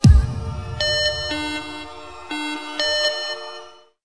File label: sms